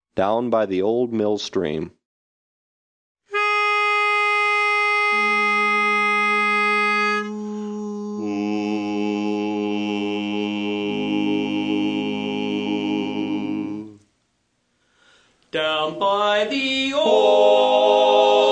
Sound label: Learning Bass, barbershop harmony